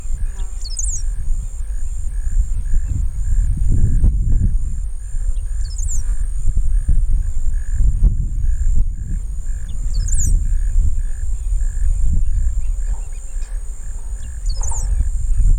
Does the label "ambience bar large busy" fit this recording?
No